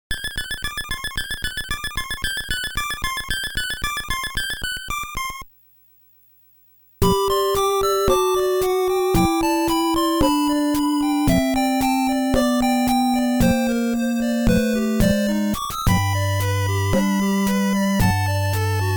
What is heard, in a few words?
level 20 hi clear (ufo) - ending